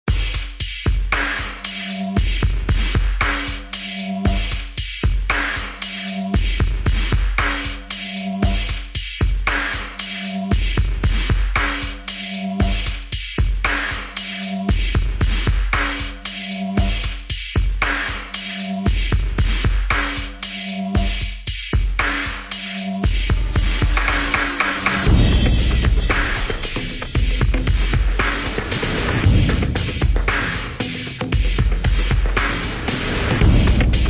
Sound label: Percussion; Fired Earth Music